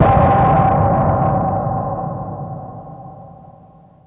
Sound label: bigg blasss, spacey drums; Spectrasonic Distorted Reality 1